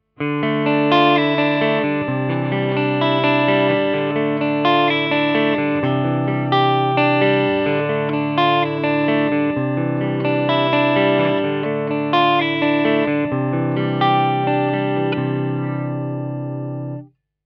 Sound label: Boggart Clean